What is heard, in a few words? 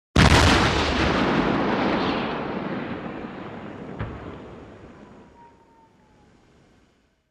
Artill Tank Gun Fire, modern artillery exterior